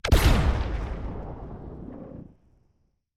Sci Fi Gunshot Heavy Blast Weapon

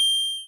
Ping

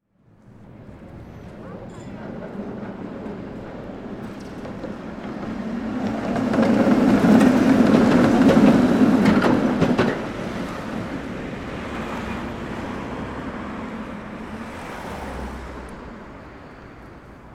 Tram Is Passing

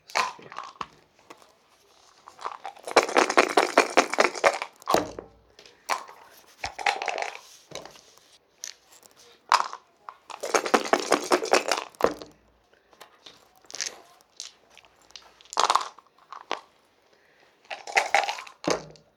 Dice Cup

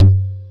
Djembe cheap mic session

